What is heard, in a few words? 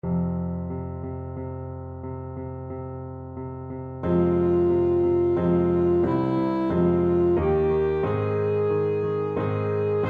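pavane, alto saxophone; capriol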